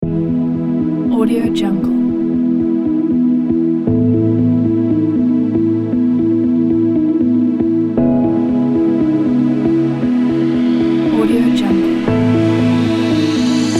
inspiring abstraction kit

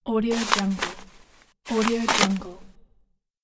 paper crumpling

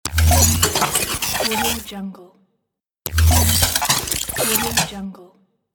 transform

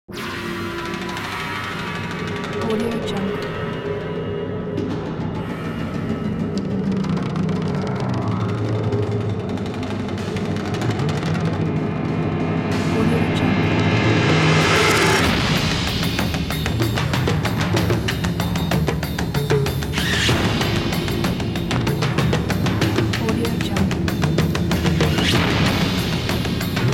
mysterious mystic

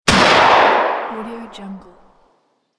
antique shotgun shot

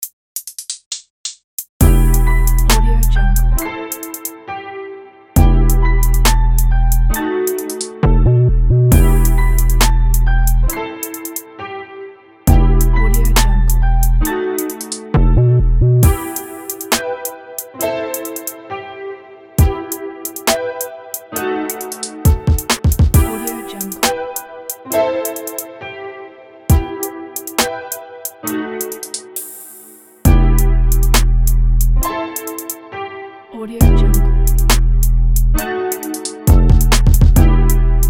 drill hop; kenjomusic

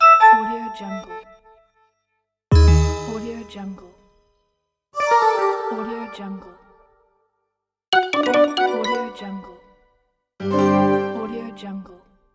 user interface phone menu sound

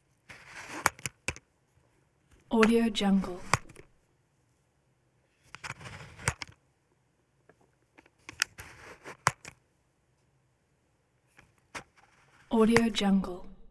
camera bell howell 8mm lens change; rhumphriesdc

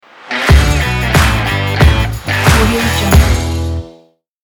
modern rock logo

